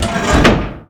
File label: Spaceship Door Close